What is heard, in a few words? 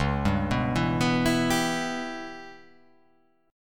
Db Minor 7th Flat 5th Db down, chord; arpeggiated; JGuitar